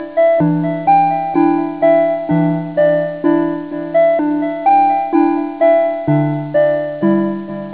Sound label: BELLS